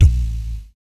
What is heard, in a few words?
VOC PERC, Vocal Planet Jazz FX BASS PERC